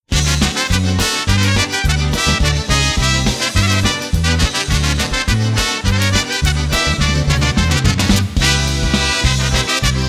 Polka

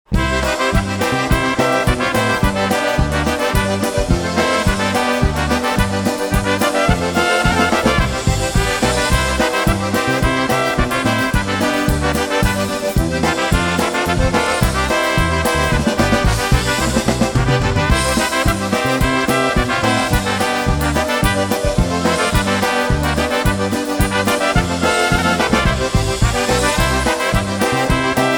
Polka, Live Jam

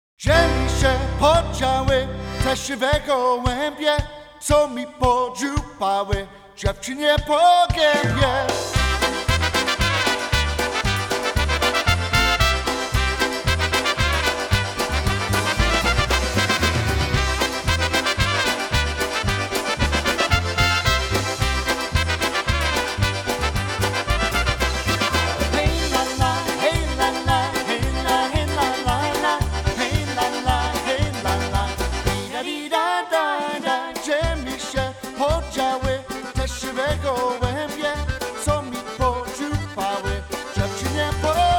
Polka

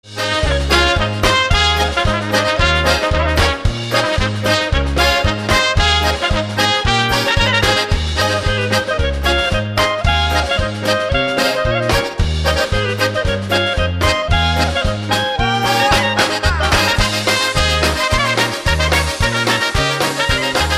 Parkway Polka

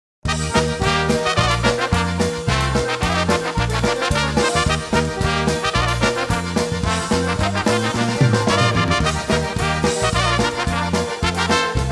Polka

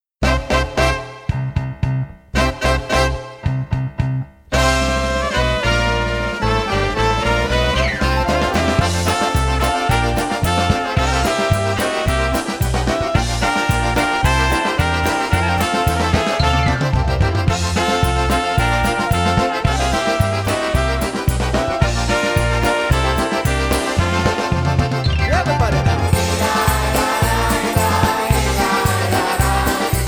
Polka

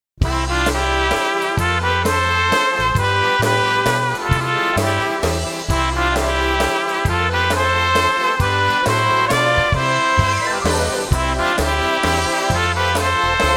Waltz

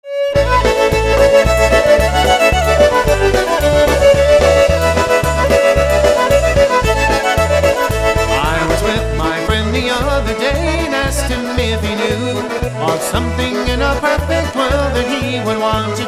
Polka

